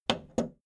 video tape player plastic rewind click slow

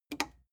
plastic button small simple push

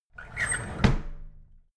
drawer big squeak close heavy

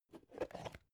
plastic bowl small open soft

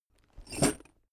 backpack metal single

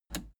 plastic button scratch smooth push enable

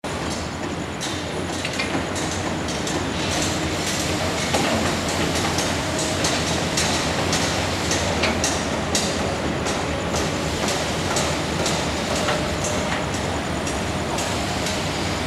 construction site atmo

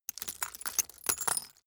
glass debris fall sequence short

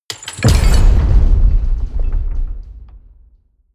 gate slam large

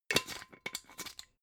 glass bottle massive close scratch

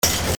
metallic impact

